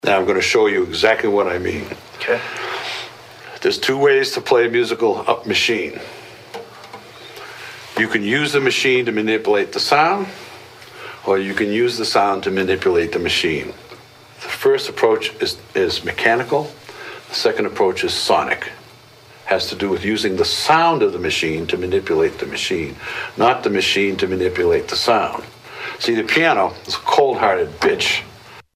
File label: piano